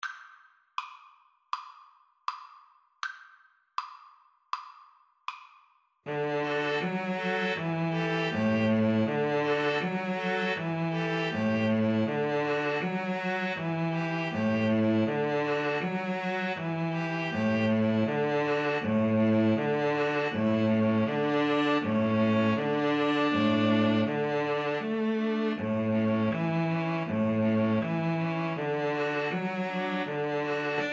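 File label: string trio karaoke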